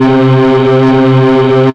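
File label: Distorted Trombone; instrumente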